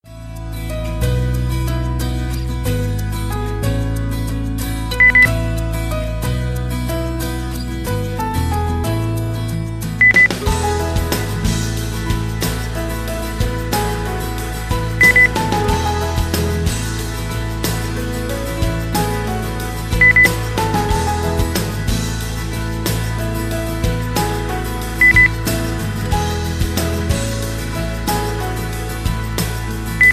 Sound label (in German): sommerregen